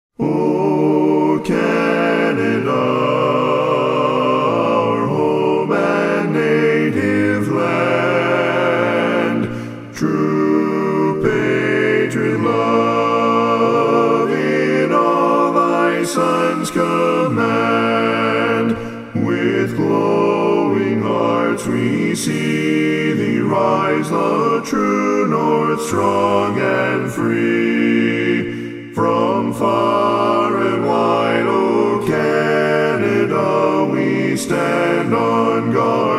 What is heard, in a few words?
4 part